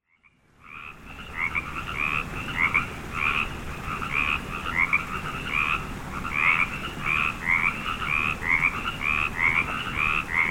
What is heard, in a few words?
Frogs